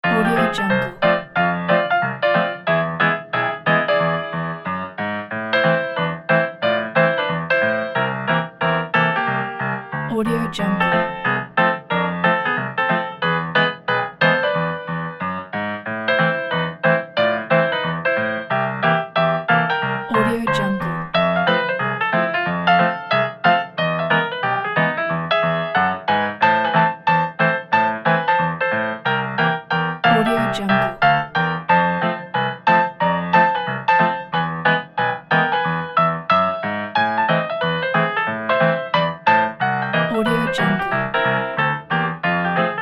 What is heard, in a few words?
funny old ragtime